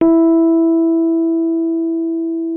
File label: MK1F E4, RHODES; Xsample VOL-1